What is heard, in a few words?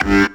G STAB, funky